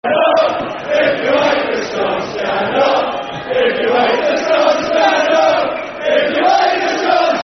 Stand Up If You Hate The Scum, Football Chants (Soccer Songs) - Portsmouth